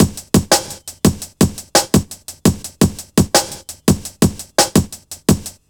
170 break, Explosive Jungle

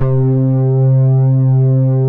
PADS; Pro-Rec - Synthsonic; Z1 PADS

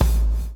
BLOW; Spectrasonics - Backbeat

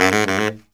baryt, Killer Brass Riffs; USB Soundscan vol.29 - Killer Brass Riffs; AKAI

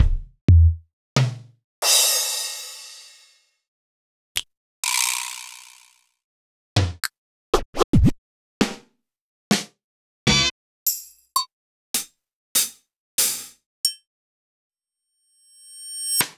Roland Juno-D Hip-Hop Kit

